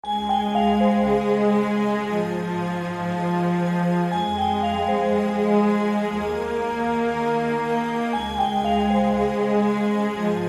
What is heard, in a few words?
Synths Strings